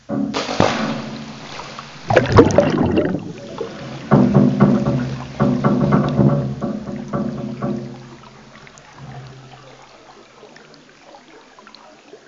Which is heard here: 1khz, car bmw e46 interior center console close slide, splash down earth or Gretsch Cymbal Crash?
splash down earth